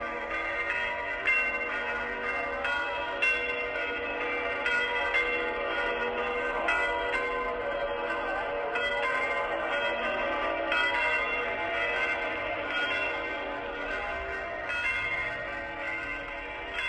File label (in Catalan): Campanes Vaticà